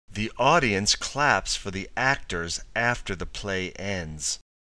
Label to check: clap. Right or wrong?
Right